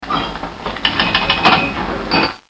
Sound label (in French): Flipper